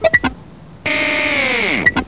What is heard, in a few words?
going up; hofclient